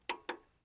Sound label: dvd player buttons; prosoundfx